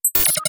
Drone

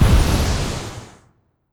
Hawk Fire Burst

